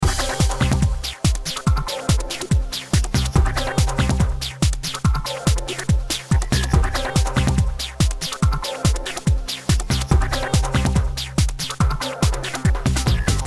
Techno; Color Cross; nds gamerip

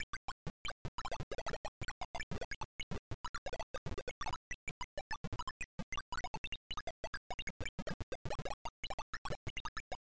Level Intro; jurassic park nes; DNA Message Chain

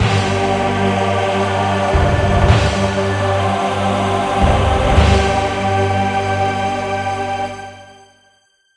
Dungeon complete

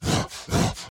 Enraged Breathing Loop; The Town; Burns

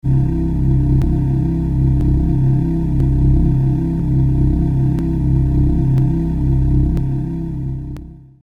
ominous sting; Strong Bad's Cool Game for Attractive People

